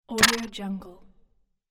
swirly select sound; fxprosound

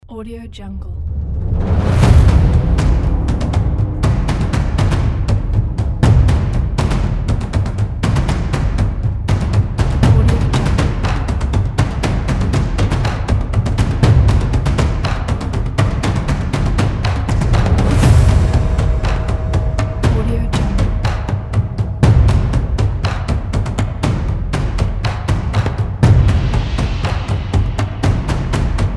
deep epic drums

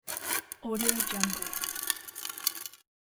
metal creaking on concrete

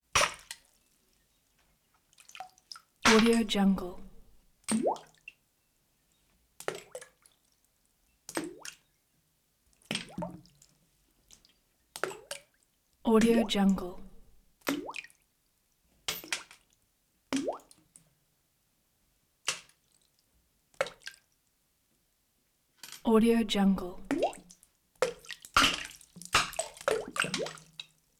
coin drop into water wishing well fountain

